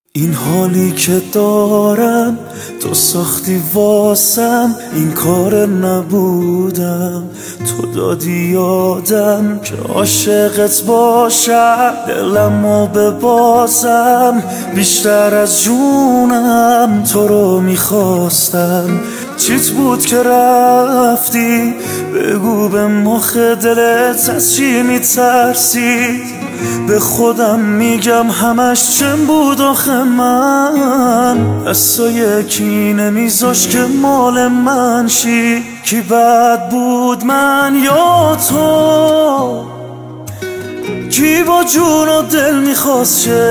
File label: Guitar